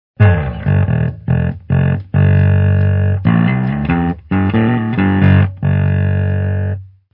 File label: bass ring